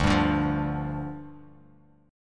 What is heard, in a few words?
D-Minor Double Flat 5th, D, Bass Standard; 10,10,12,10; Dmbb5 strummed